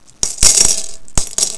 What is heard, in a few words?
coins